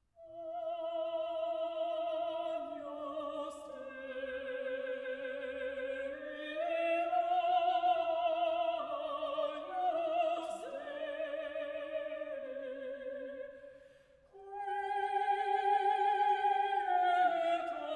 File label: Agnus Dei, Soprano, Mezzo-Soprano, Chorus; Giuseppe Verdi; Verdi Requiem